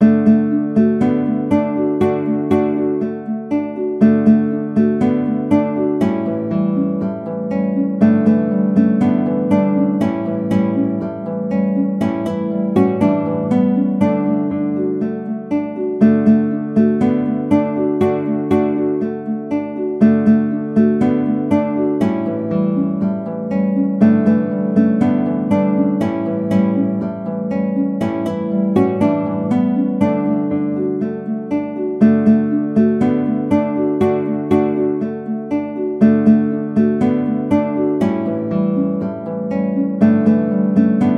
Tom Dooley Guitar

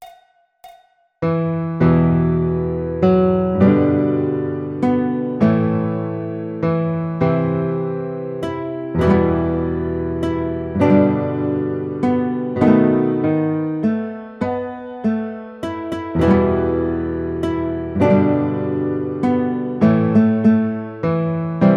House Of The Rising Sun Guitar

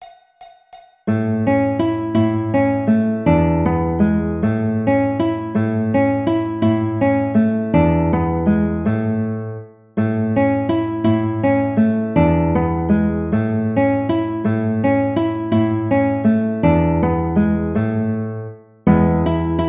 Waltz Guitar; classical

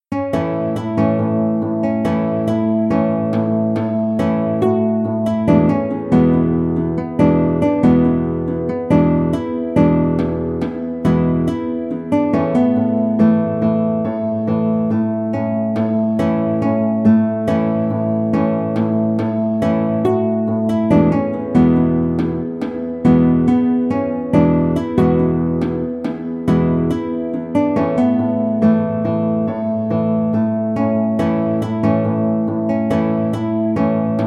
Pay Me My Money Down Guitar

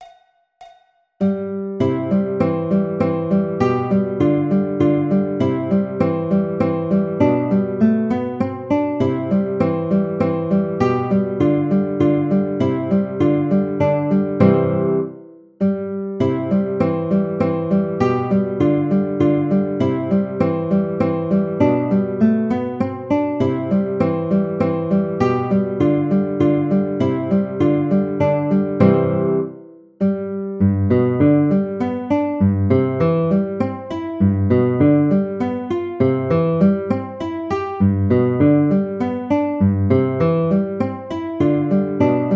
Andante Grazioso Guitar, classical; Carulli Op 241 No 24

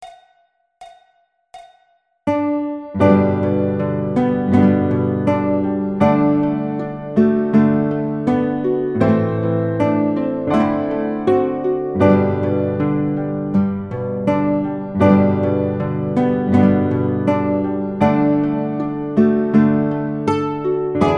America The Beautiful Guitar

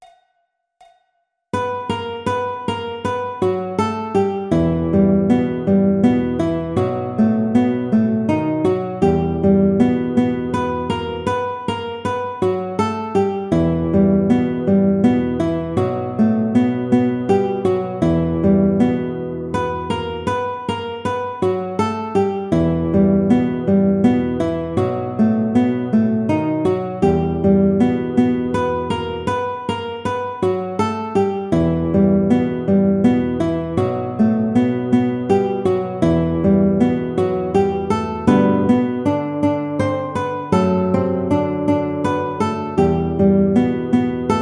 Fur Elise Guitar

